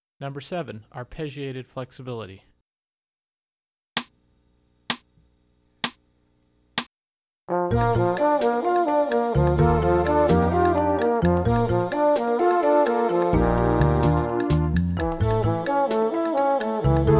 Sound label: Arpeggiated Flexibility, trombone; 15 Minute Warmup